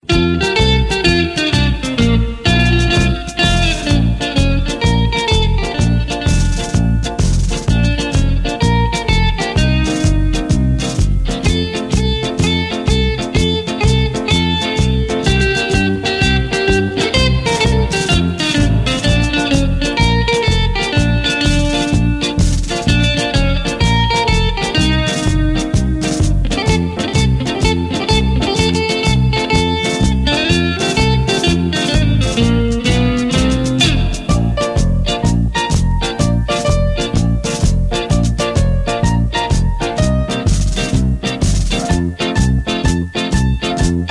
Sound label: music